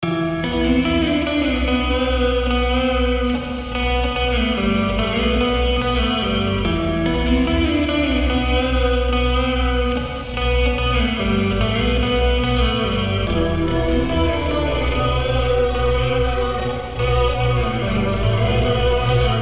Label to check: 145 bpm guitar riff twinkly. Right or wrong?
Right